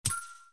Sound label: big button yellow; plinko